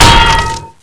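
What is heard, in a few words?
crowbar hit heavy, weapons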